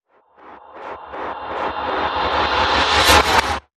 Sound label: Glitch Morphing